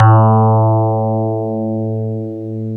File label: FM Rhodes; Club-50 - Foundations Roland